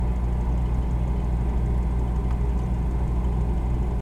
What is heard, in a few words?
Refrigerator Drone Loop